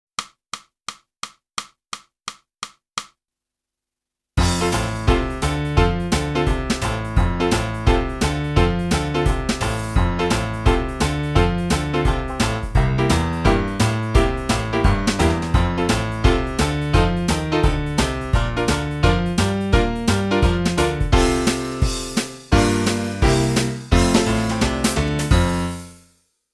flute

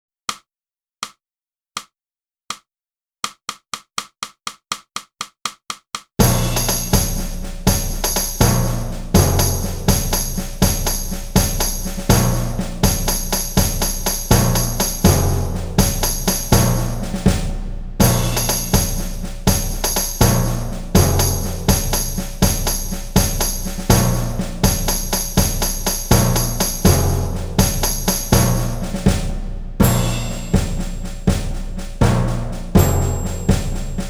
clarinet; Boosey Woodwind Method: Clarinet (Book 2)

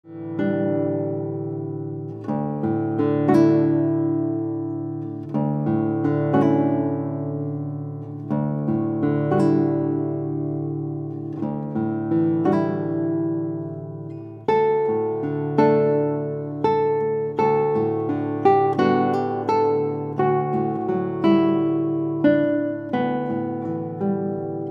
Guitar

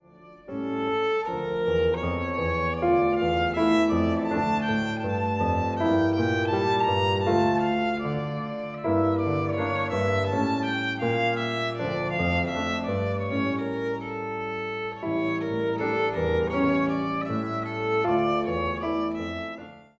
VIOLIN

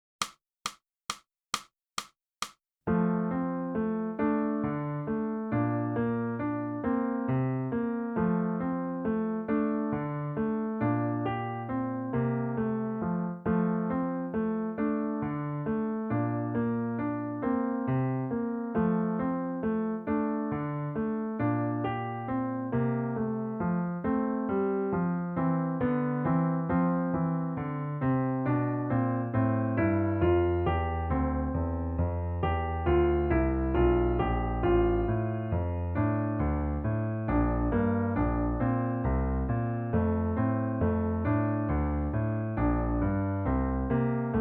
sax

